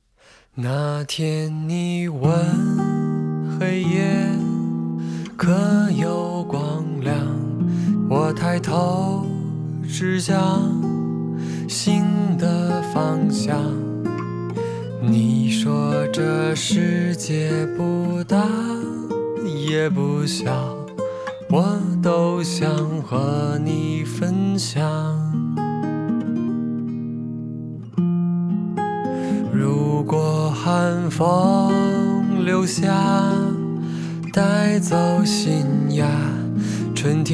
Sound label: Guitar Vocals